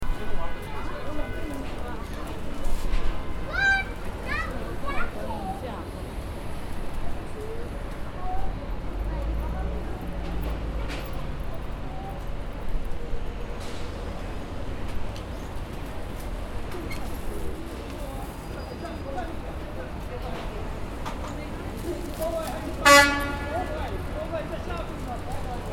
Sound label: New York Chinatown